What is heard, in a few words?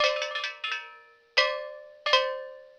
RHYTHM; CMP - Analogon